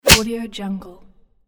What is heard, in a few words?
slap in the face